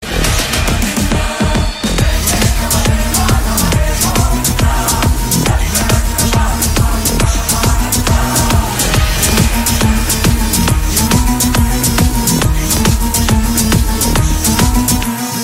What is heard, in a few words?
Trance; Krishna